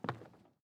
Step

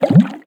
Object Exit Water

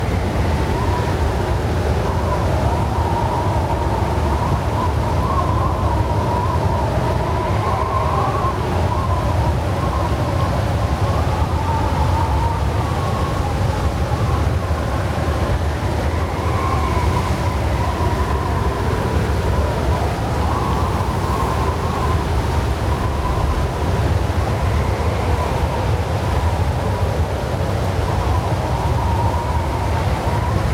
Storm Open Window Loop; Storm Lv3

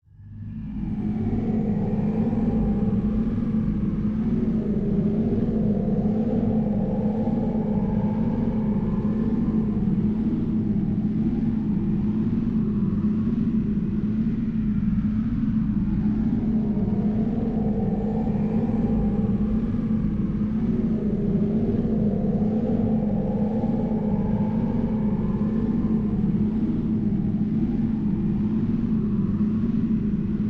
fire loop; nekomata

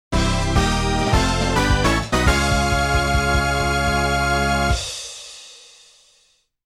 Goal in #1 (1st); Mario Kart 64

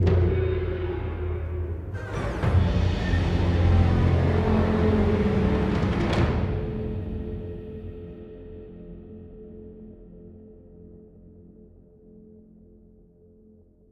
Combat Start Sting; Necropolis

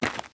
Cave Footstep; The Inn

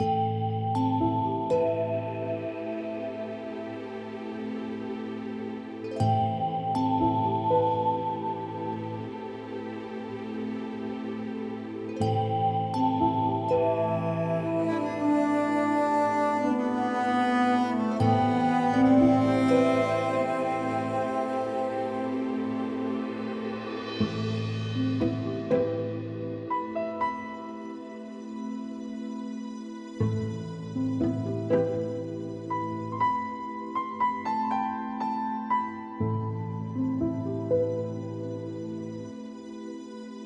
Tutorial Level Start; Death's Door; Tutorial Level Start Old ( Tutorial Level Progress= Start)

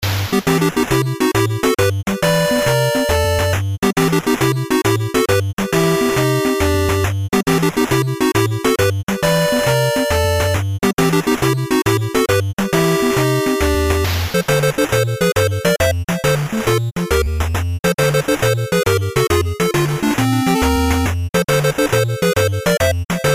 Battle; That Brave Per Hesitation; HappyHoppyHappy; Android

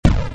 Bomb hit; gamerip; Robot Wants Kitty

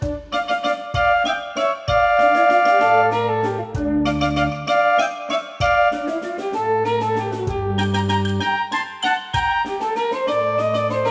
danger funny; Identity V

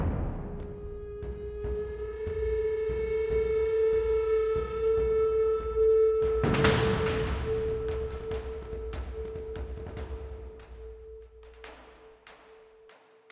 Hunt Ambience; Assassin's Creed III

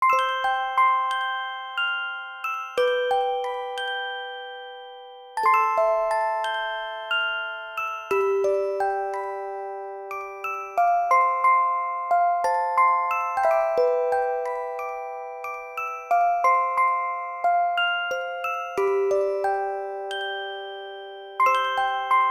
Spell (Orgel)

